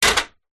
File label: industrial step ladder metal set down on feet